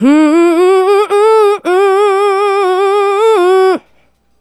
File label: Female Solo, Vocal